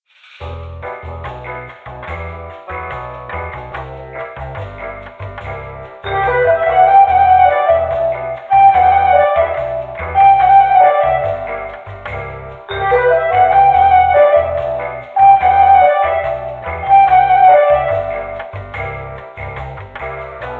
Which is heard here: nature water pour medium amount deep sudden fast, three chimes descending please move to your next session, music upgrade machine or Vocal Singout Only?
music upgrade machine